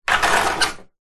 household window blinds venetian wooden pull open or close; zapsplat